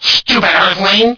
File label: ufo weapon